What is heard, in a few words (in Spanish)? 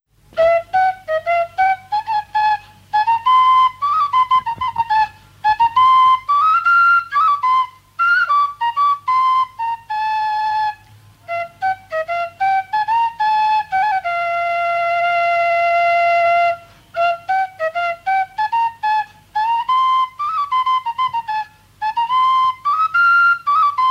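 Pasacalles de danzantes, Bailes tradicionales en Valladolid; Altísimo Señor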